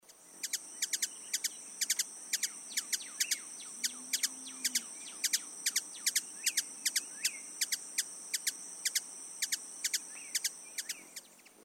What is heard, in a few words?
Ruby throated Hummingbird